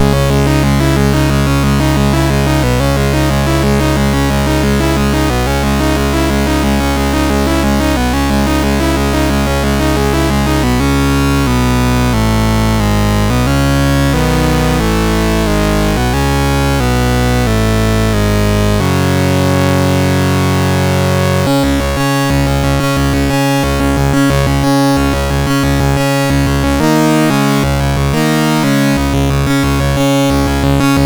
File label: Synthetic Metal, MOS6581; MOS6581R2